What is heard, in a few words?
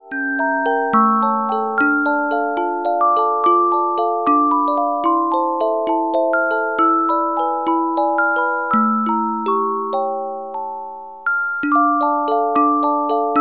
Carillon Music Box Melodies